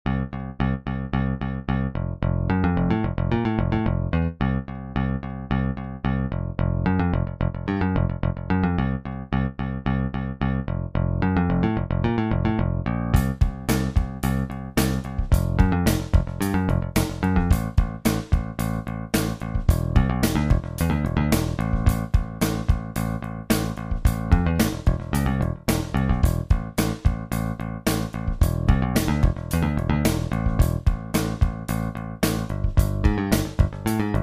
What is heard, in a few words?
Blues Piece